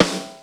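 snare power, Drum Machines, Yamaha Motif ES-Series; 300 Drum Machines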